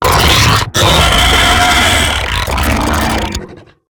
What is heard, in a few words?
Death; Evolve; Gorgon; Lurker